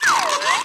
springrider squeak; video games; player; taunt; source engine; tf

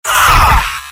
pain sharp; scout; source engine; tf; mvm

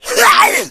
smoker attack; voice; source engine; left 4 dead 2

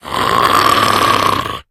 hunter alert; player; voice; source engine; left 4 dead 2

